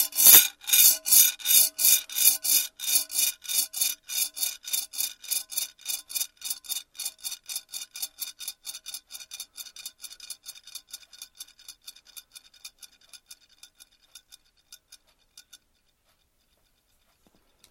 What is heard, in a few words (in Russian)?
zvuk vintovoj prokatki na plastine